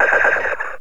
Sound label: sound firing drone 3 shot burst